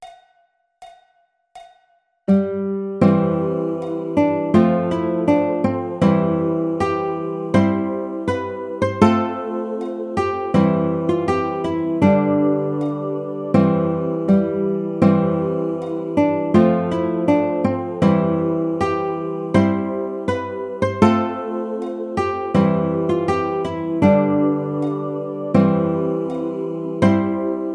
Minstrel Boy Baritone, ukulele

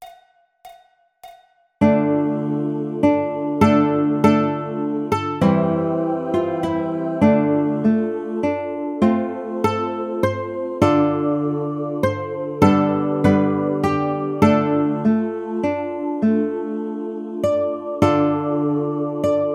Scarborough Fair Baritone, ukulele; Jolly Roger Ukulele Book 1

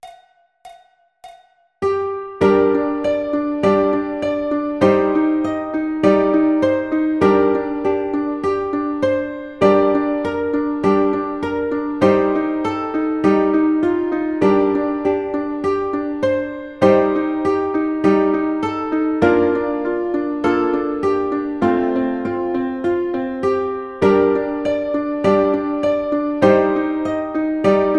O Come Emmanuel Ukulele

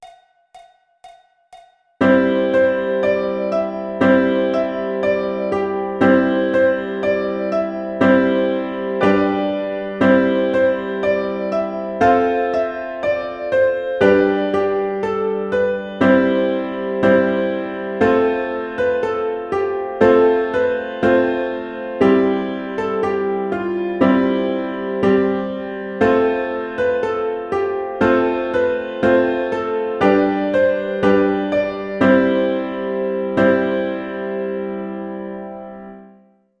Yankee Doodle Ukulele; Jolly Roger Ukulele Book 1

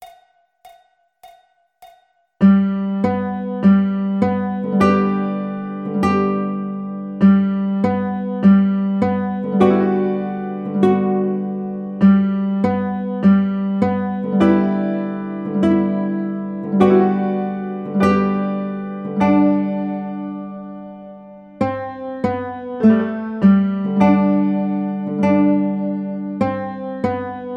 My Spaghetti Monster Baritone In G, ukulele; Jolly Roger Ukulele Book 1; Lesson #8: My Spaghetti Monster; Baritone Solo

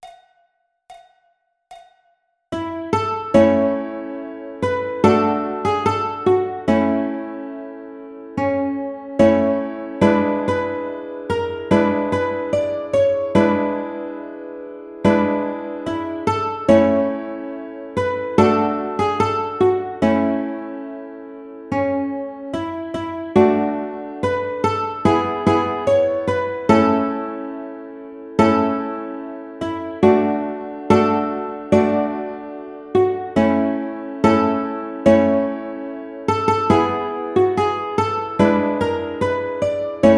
Aloha Oe Ukulele; Jolly Roger Ukulele Book 1

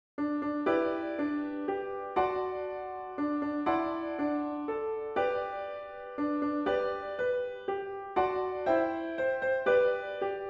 Happy Birthday, ukulele

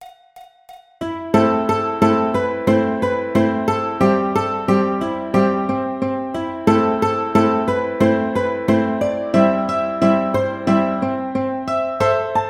Ukulele

